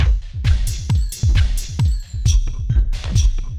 thump 133; Loops

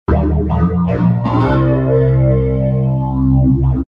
BassAmb C 127

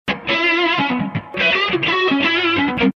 D 080-100 solo Wah

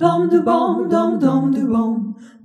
Jazzy Harmony

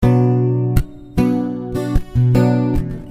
Punchy Bm

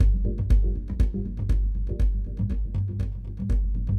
Mallimban Gm7 120

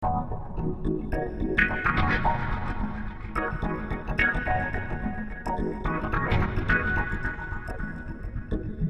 Swinglefunk D 108

